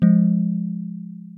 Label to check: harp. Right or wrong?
Right